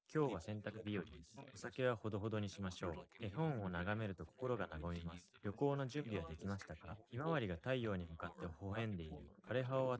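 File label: male, instantaneous mixture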